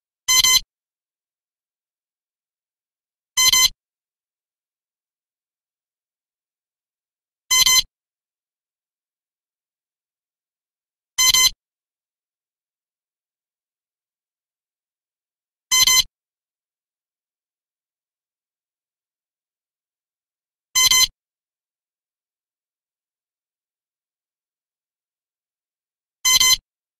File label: Lie detector